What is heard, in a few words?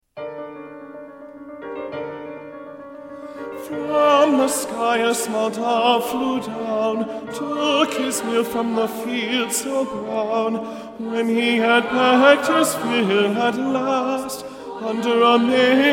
The Dove Tenor English